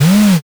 servo

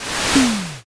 fire magic

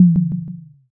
tsuzumi

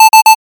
alarm

